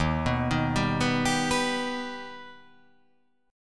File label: D sharp Augmented D sharp, chord